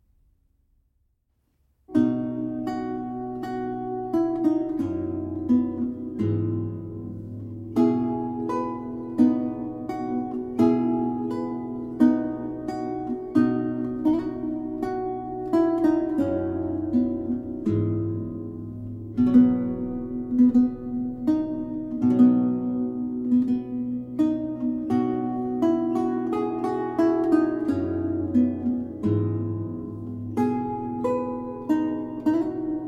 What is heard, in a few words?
A Scots Tune, Lute Music of Scotland